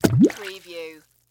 Splash Rock Drop

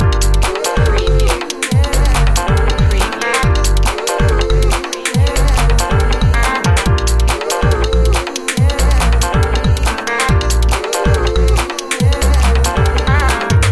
keen tweaks loop

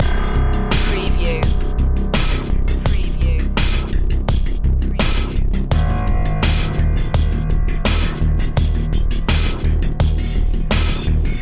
broke apart inside loop

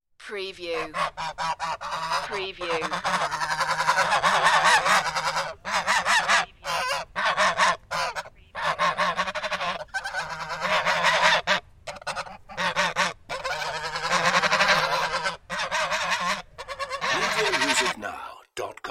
Gaggle of Geese

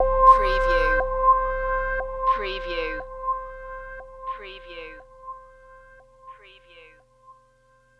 High Evil Tone

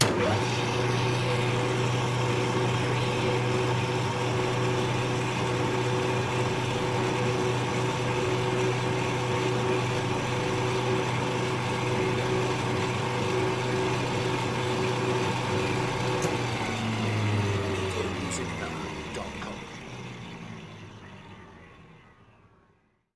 Drill Press

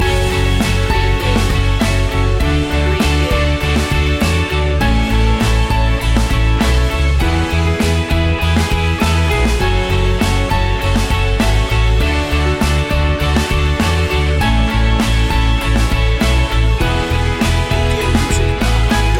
now and forever loop

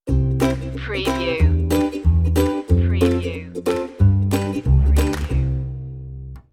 reggae happy stinger

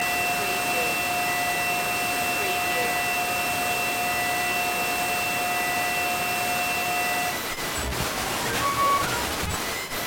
Brick Radio FM Tuning; Sony

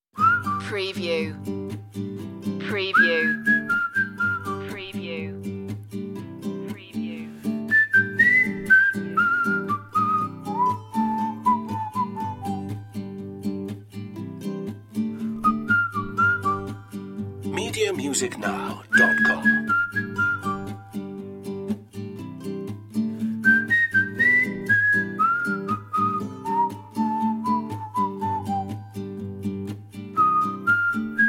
blue eyes whistle version

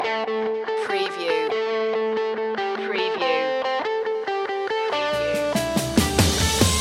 trashed underscore loop

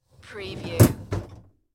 Dishwasher Rack Slide

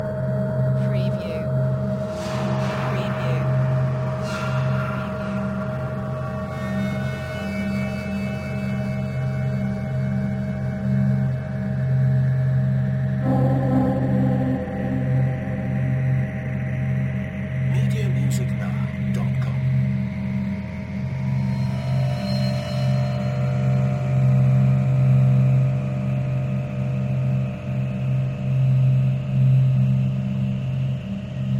Horror Scifi Soundscape (loop)

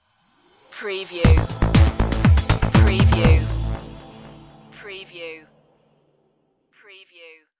neutron groove stinger

